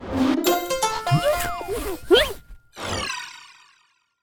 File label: Powerup Foreground; Stitch; Disney Spellstruck